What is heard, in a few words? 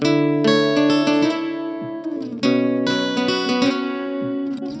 Acoustic Guitar